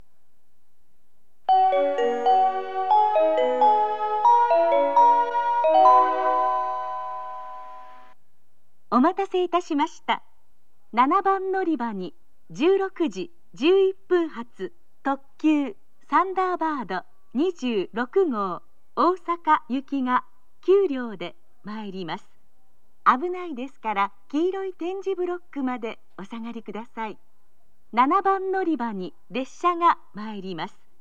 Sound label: limited express osaka, kyoto, station; thunderbird26